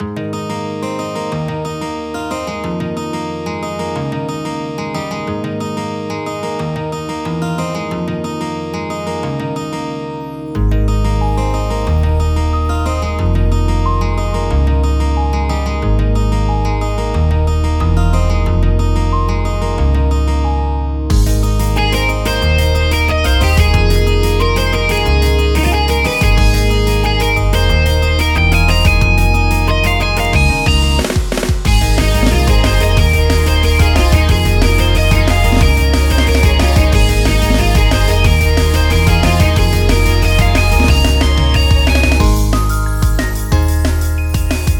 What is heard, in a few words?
Instrumental